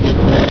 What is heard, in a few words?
tentacle alert; source engine; hl1; video games